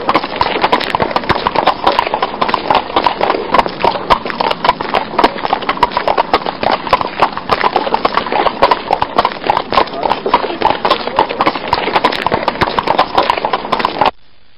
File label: Horses Galloping Off